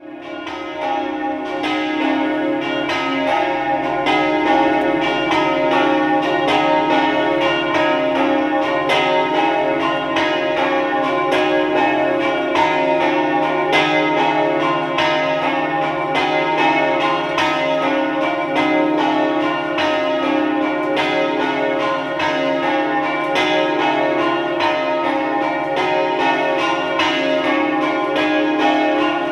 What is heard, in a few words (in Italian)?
Cordenons, campane